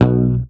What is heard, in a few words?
Bass, MUTES; Sampleheads; Will Lee Bass Library; HAMER12